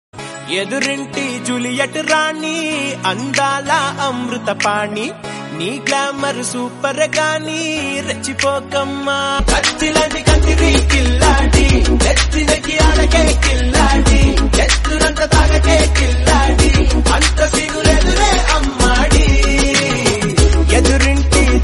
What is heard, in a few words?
Ringtone, Telugu; energetic and trending